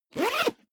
zipper backpack close fast

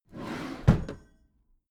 packed drawer smooth close heavy

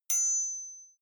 knife hit metal ring

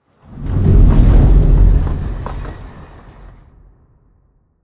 promo pad

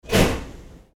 train door closing

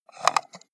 computer mouse wheel scroll fast

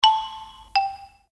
chinese xylophon

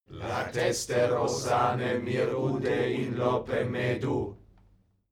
male crowd evoke

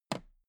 toolbox handle bounce soft

